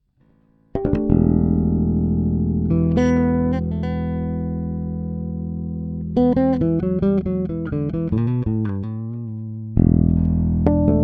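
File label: JB2 Harmonics soloing Both Pickups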